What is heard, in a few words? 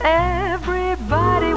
everybody, mary SUNG